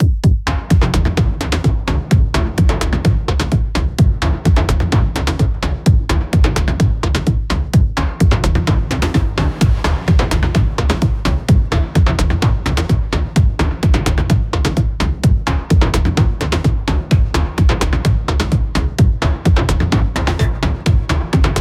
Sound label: dark dungeon electronic dance music